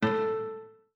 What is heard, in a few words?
Windows Ding; Windows 7 Sounds; Heritage